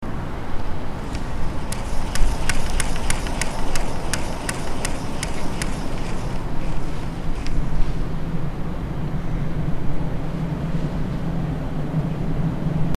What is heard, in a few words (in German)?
spielzeugwindrad